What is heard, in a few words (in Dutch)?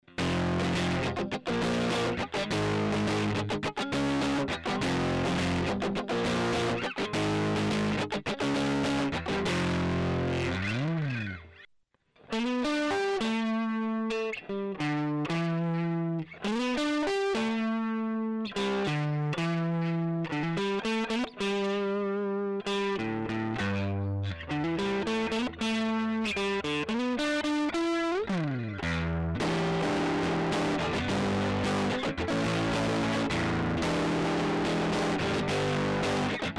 Rock gitaar